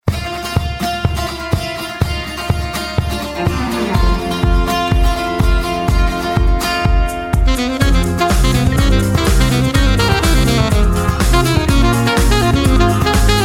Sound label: Sax